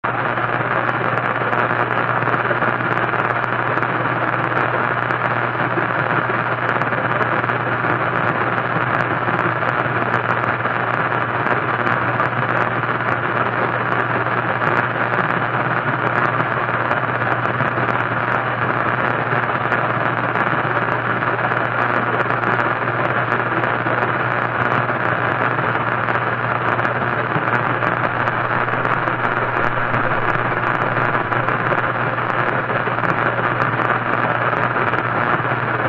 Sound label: Radio noise; radio signals